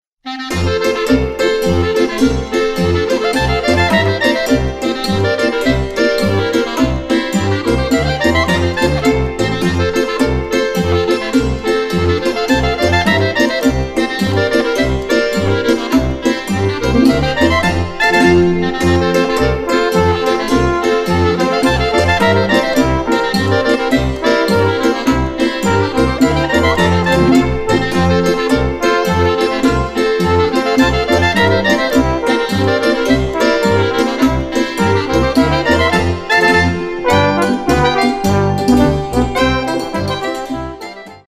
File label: polka; Echlawossra